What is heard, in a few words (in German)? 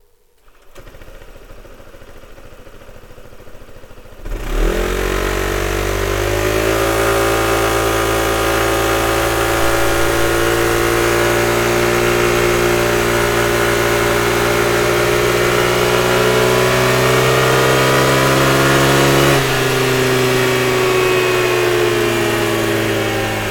sound akrapovic auspuff honda forza 125